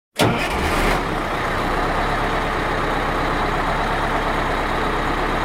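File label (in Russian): громкий долгий звук заведенного двигателя грузовой машины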